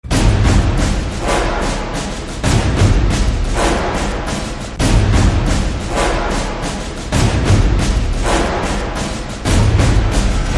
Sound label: Trailer Theme BGM Ringtone; Chekka Chivantha Vaanam; Tamil